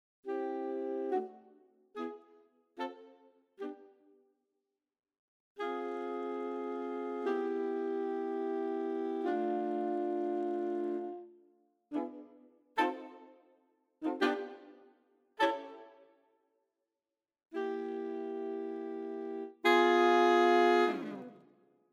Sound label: Swing Sax Section